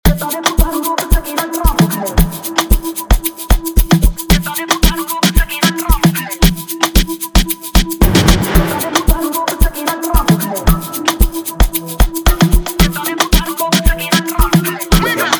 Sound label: Amapiano